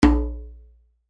Djembe, percussions; tribe percussions

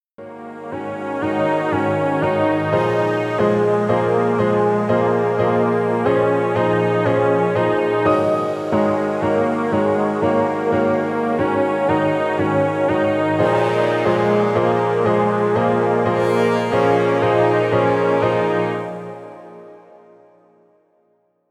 Brass Melody; ambition brass melodies

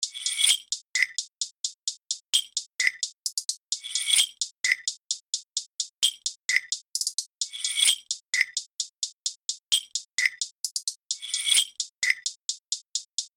Reverse Bell Hi Hat Loop 130 BPM

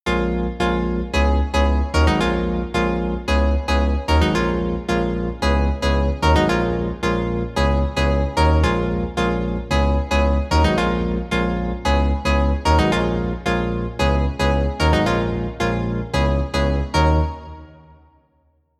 Electro Funfair

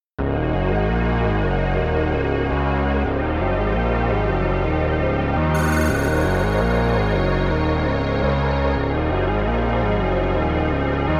PS-1 Arcade

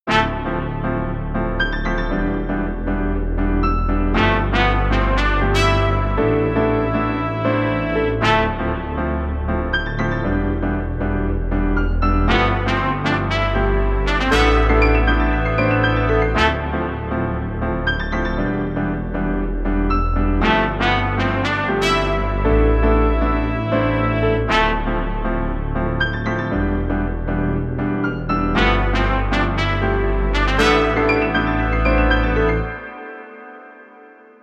Joy 118 BPM

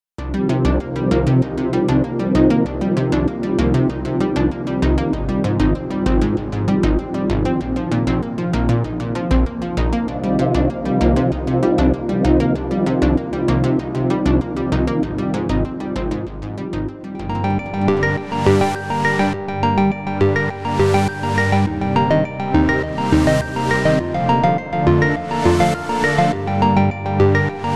Synthwave; standard pack 2

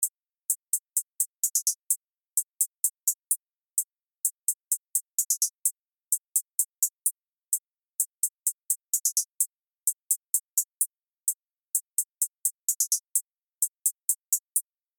Bullets Hi Hat Loop 128 BPM

